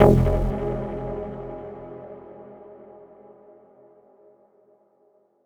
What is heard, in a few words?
stab stupid, stabs